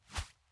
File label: woosh; Ion Shift